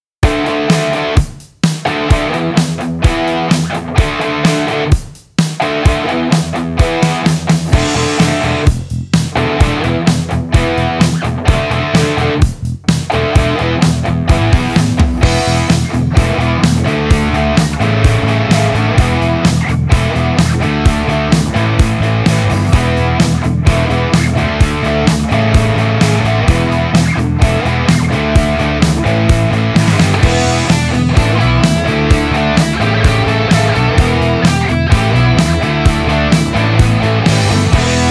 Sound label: Sport Music